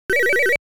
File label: Time Bonus - Extend; Pengo; arcade